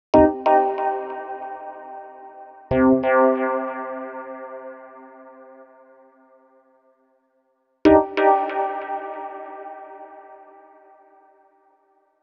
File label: Dub Rhodes; KJ Sawka; Drum n Bass & Beyond